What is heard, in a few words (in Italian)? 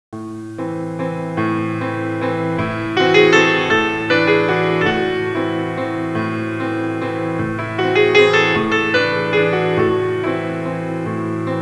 suonerie classica; musica classical